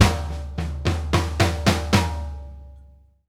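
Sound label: dynamic right, drum fills; Sampleheads - New York City Drumworks VOL-1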